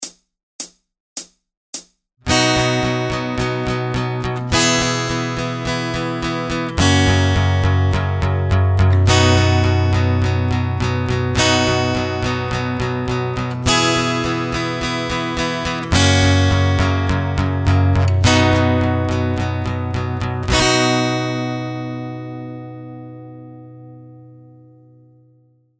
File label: The Power Rock Strumming Pattern, capo 2, SLOW; Ultimate guide to strumming book